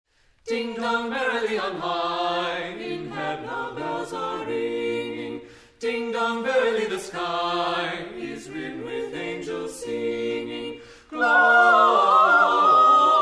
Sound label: SATB